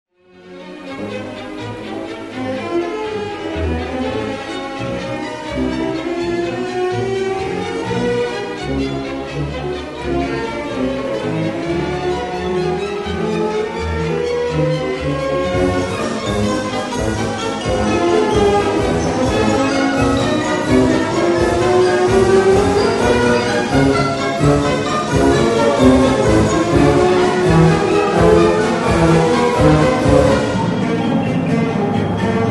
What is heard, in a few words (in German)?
Sinfonie live